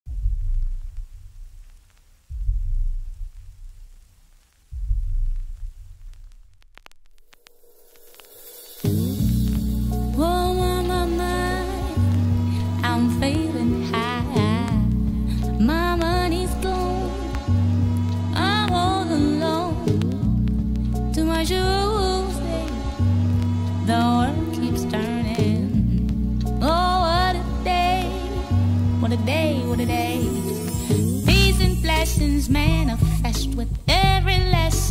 Chill Hip-Hop & Soulful Beats

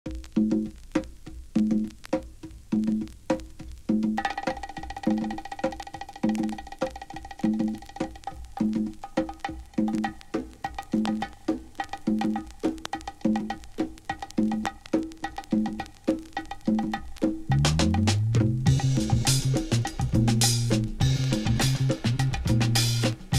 Psychedelia & Acid Folk

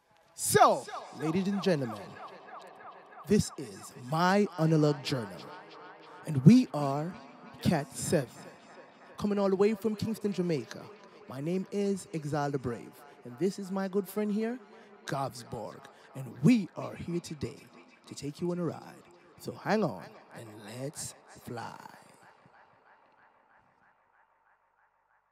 Reggae from Yesterday and Today; A Deep Dive into Reggae from Yesterday and Today

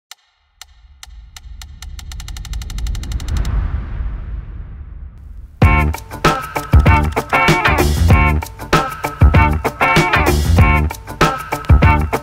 Summertime Downtempo Disco, Nu-Disco

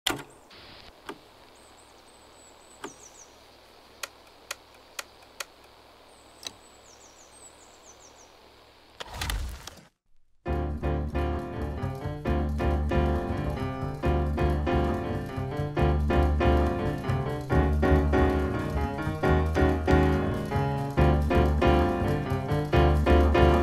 European Label Jazz-Dance

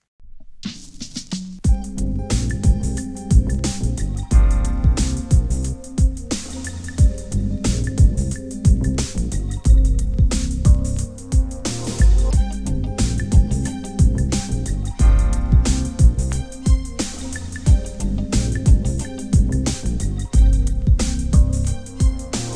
Brazilian Street Soul

